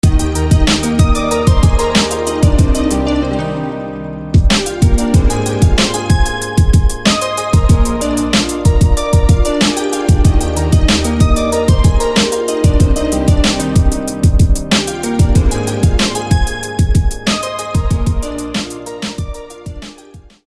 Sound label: Melody Rain; SFB FX